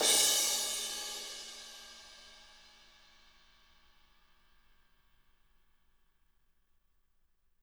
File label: crash, crashes